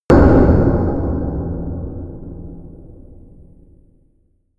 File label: big metal plate